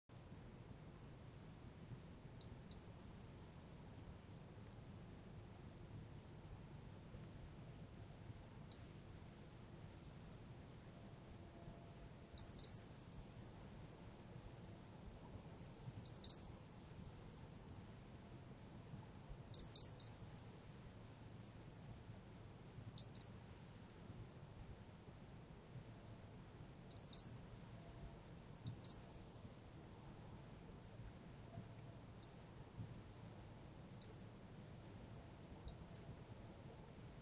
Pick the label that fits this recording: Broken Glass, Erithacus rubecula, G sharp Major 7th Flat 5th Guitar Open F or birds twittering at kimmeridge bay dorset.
Erithacus rubecula